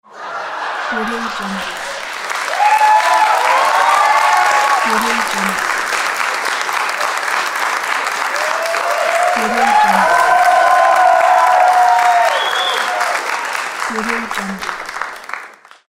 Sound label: audience laughter and applause